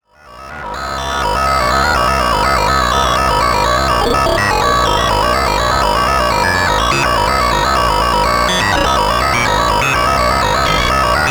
Xaoc Devices Leibniz Binary Subsystem Thru Zero Phase Modulation